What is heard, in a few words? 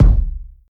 reverb bass drum sound c sharp key